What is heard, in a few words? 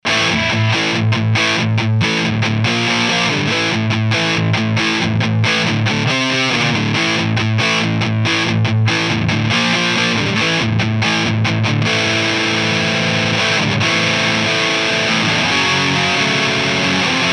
AMP DIst